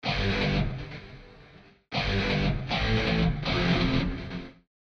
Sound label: Damaged Guitars Straight FX